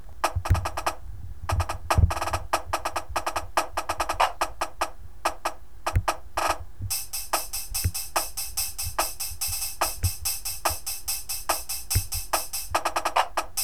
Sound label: snare, drumline